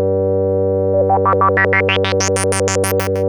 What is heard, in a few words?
MOOG G4, Synthesizer; Hollywood Edge; Giorgio Moroder Rare Synthesizer Collection; MLTI.MOOG